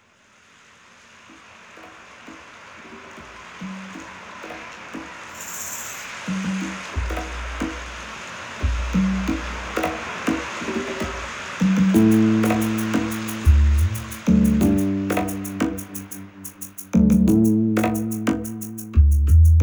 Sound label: Cool Sax Mix